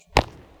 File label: Baseball Hitting Glove